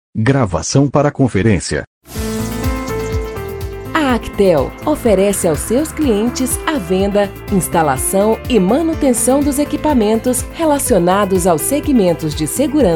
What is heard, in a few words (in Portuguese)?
Chamada Espera; Actell